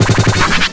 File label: perfect landing, skater; Tony Hawk's Project 8; XBOX